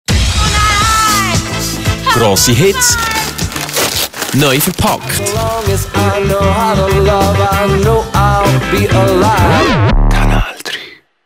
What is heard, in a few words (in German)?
Jingle Coversong